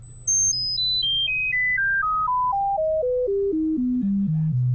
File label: mic sweep